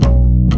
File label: Upright Bass Notes